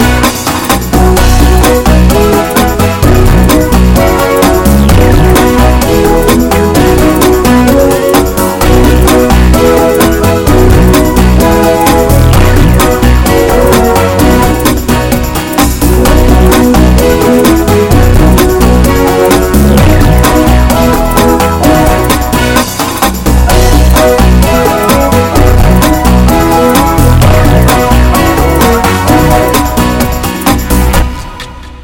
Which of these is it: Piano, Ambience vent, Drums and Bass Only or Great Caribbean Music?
Great Caribbean Music